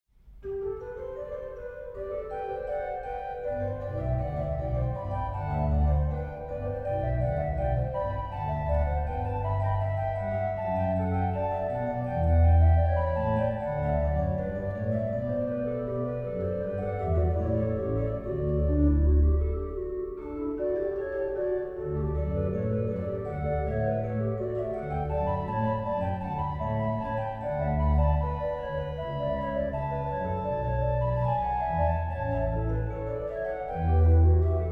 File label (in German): Chororgel Trio